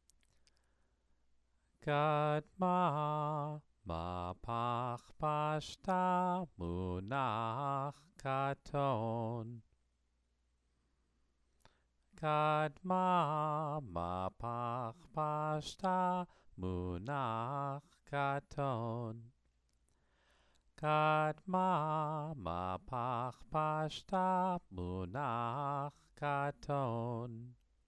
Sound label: Megilat Esther exercise